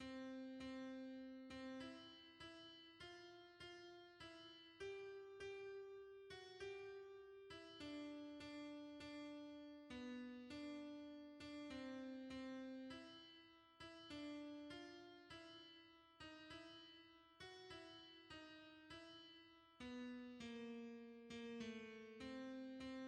alto; hymns; Lord what is man Tis He who died

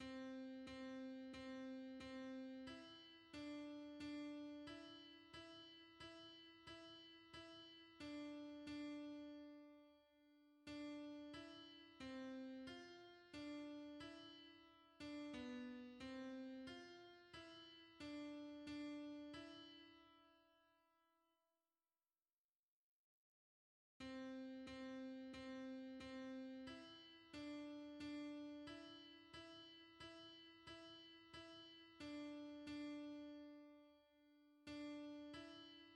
alto; hymns; Now may the God of peace and love

